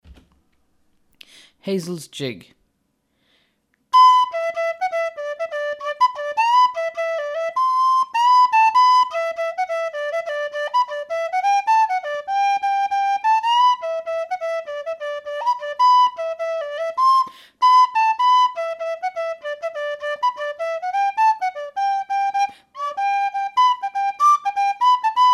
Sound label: hazels jig